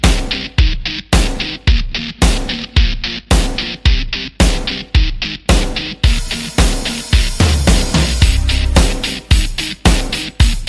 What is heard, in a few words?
Instrumental Song Ringtone